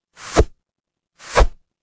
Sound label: transition swoosh